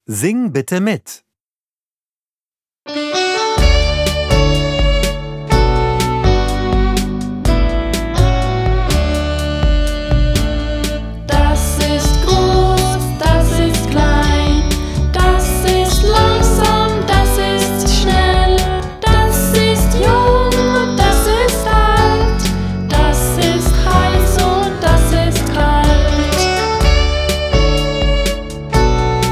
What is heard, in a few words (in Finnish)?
laulu; Ubung 5